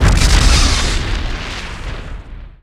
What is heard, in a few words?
Explo Nuke Sweetener; Destruction